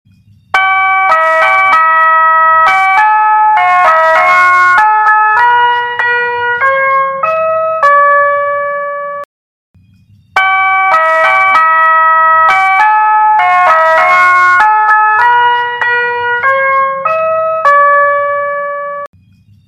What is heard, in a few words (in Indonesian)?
nada dering es krim; diamond